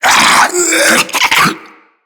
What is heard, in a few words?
Attack; Infested; Diseased Ancient